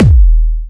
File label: BD, korgdrums; Korg Electribe; ESX-1; electribrary-3